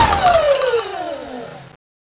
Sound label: minigun stoping